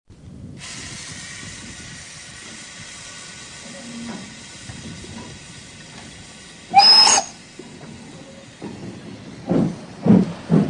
Lokomotive

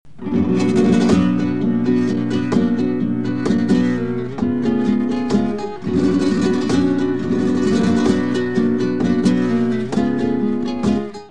gitarre

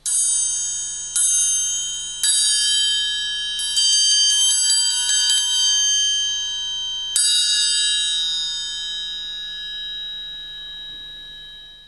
Triangel

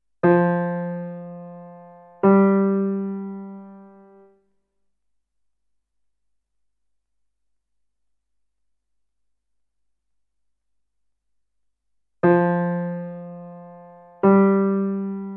Sekunde

